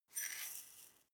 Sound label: herbs tilt; soundpool